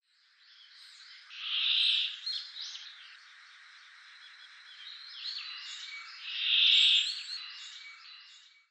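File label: brambling; atori; azumino; koe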